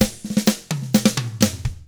surf rock, Drums; ILIO; Double Platinum Drums 1

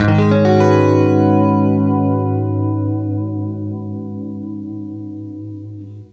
CLEAN G#M9, Guitar; East West; Steve Stevens Guitar Samples Collection

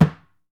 deff flat; Deff Bendir &Req

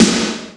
AMB SNARE; Rubber Chicken Software Sample Bank; DRUMS + PERC

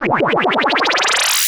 Misc Synth FX; musicradar; cartoon caper

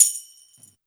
TAMBOUR K, Ragga & Dub Reggae

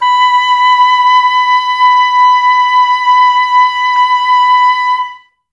OBOES B; Miroslav Vitous

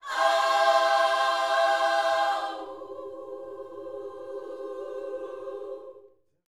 Vocal, Gospel, PHRASE; Spectrasonics

